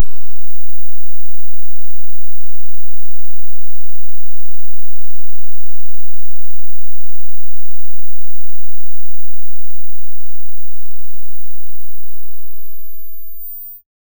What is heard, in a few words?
firing a nasty large lase, laser